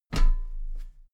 step metal ladder step top soft

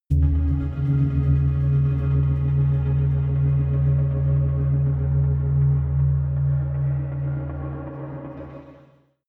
synth drone hit rhythmic

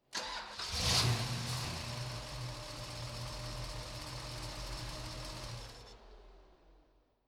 car camaro start rev twice engine

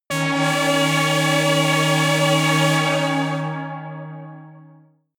synthesized saw drone high

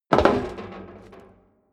step dress boots metal rattle jump; schritte; Footsteps; menschen

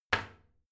toilet seat close soft, badezimmer; haus-garten

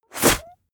spit blow; koerpergeraeusche; menschen; soundpool

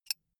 bullet handle click; krieg-waffen; geschoss

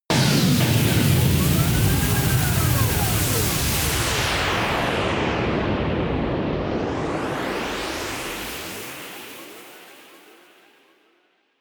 storm vortex, drones

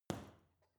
boxing punching bag side hook soft; sport freizeit; boxen

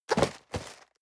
cardboard box medium turn hard short; objekte

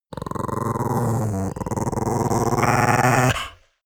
chihuahua growl heavy; natur-tiere; hunde

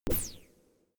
space laser gun bubble shot hard; soundpool

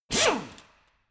impactor short high; maschinen; bohrer

